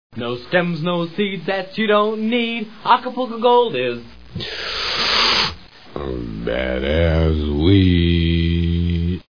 acap gold

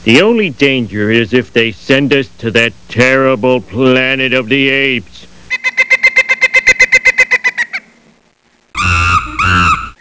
monkey song

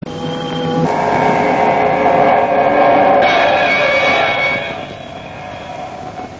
stab

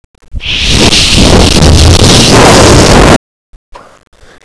explode

